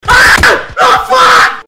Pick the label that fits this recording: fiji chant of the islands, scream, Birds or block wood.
scream